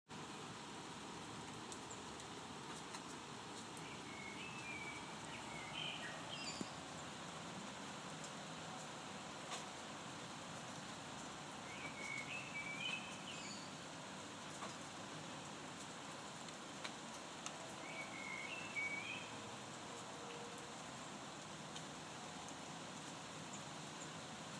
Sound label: boy